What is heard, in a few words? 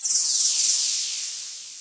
softzap